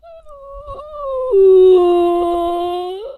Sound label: Whine